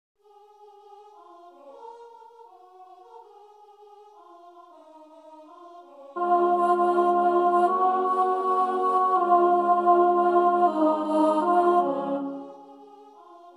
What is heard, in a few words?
Bonse Aba, soprano; Tutti; Solo